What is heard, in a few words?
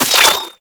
Ink Rifle Reverse Start; Warframe; Tenno